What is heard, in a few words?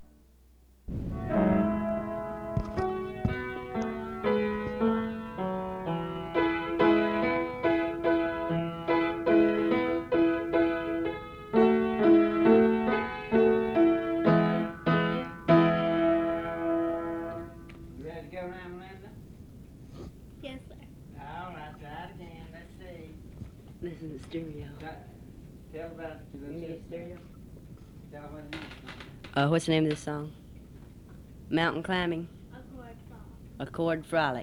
Chord Frolic